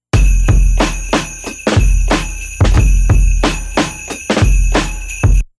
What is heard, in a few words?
91 drum loop jingle bang